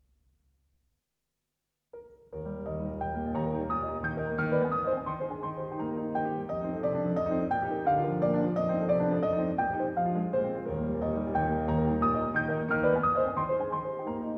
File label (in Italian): Preludio in mi bemolle maggiore, Chopin - Piano Works; Chopin - Piano Works - Vladimir Ashkenazy